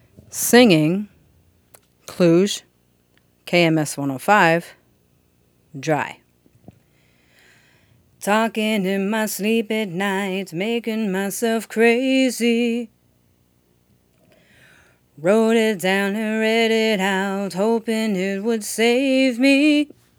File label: Singing KLUDGE KMS 105; Singing 2